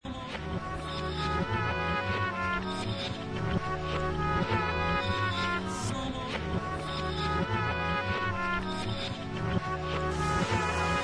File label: AMBIENT EXPERIMENTAL